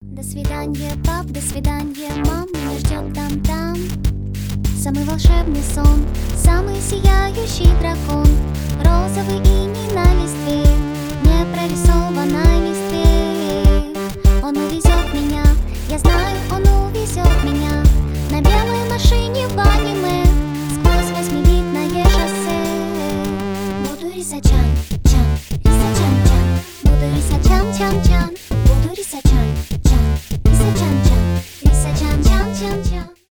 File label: 8bit